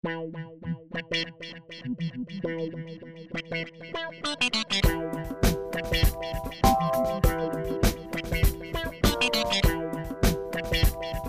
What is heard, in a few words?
funky